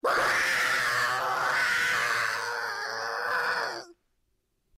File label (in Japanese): 水中